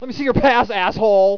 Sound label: DEMON